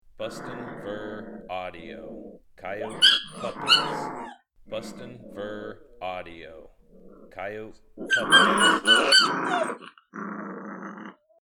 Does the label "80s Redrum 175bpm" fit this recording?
No